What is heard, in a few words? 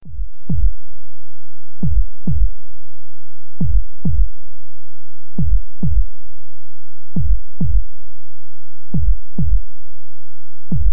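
Loop Heartbeat Speed Up 135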